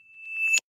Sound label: reverse ding